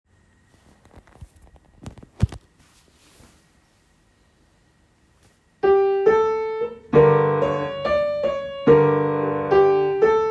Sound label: Mimi 5 notes improv